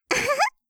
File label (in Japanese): あはは, 笑い声